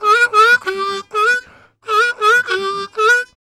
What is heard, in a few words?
Harm 129bpm G, Harmonica